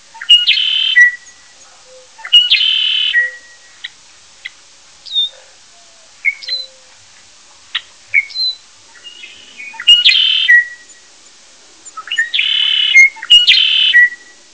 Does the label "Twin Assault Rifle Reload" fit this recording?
No